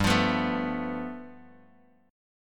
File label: G 9th, Bass Standard; strummed